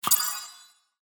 get item

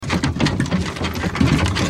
wagon

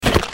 dodge

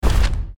gen left

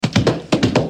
horse move

